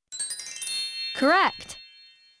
correct; Dinosaur Dig Cyber Adventure (2000)

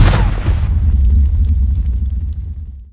smoke det; SpecOps2 MPlayer Demo

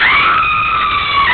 Woman Scream; Realmz 6.0.3; The Family Jewels

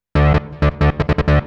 legend, 160bpm, bass, hous-tec

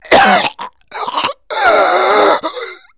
PAIN HANG; Messiah; STRANGLED

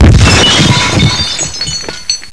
Ship Explosion; Apeiron 1.0.1; Ambrosia SW

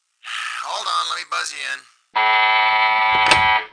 pawn buzz; Kingpin

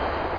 thrust; yaac

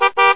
beep; TRAFFIC2

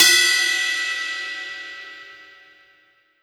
20 inch HH bell

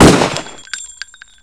machine gun; Kingpin; weapons

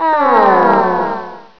aaawww; Fun, Tricks & Hacks; ClickMoire! 1.0

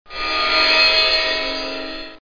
Cym Bow

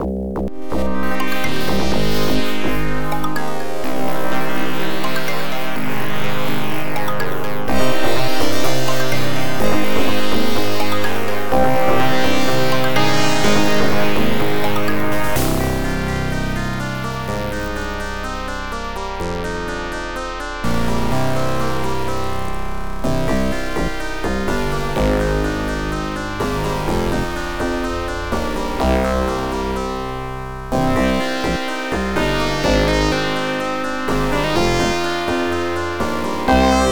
adlib

